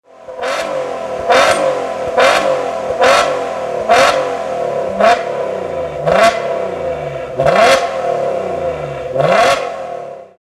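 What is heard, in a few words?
156 GTA 2 blip, Alfa Romeo; unicorse-italial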